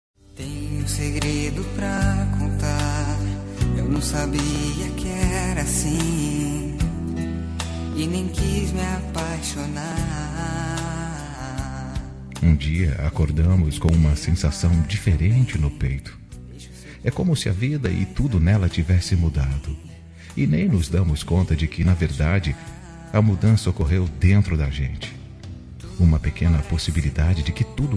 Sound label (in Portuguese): Romântica Suave